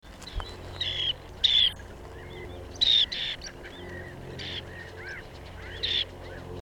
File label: Dunlin Calidris alpina